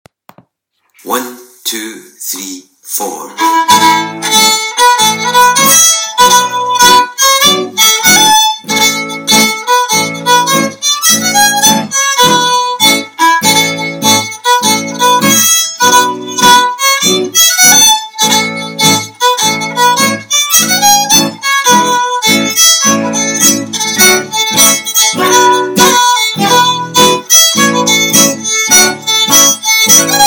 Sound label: Highland Whisky w guit; slow jam